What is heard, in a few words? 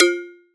FX JUG BND; ModelCycles